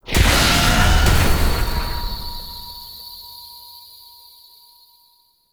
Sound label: Power Rift Activate; Zariman